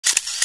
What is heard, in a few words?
Camera; L-03A; LG